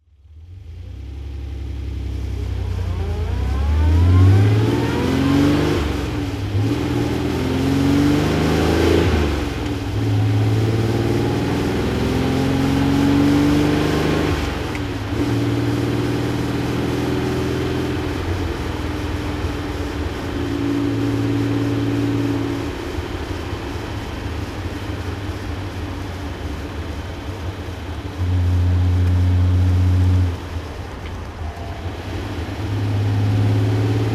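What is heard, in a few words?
austin healey 3000 mk3 ph2 sidepipe driving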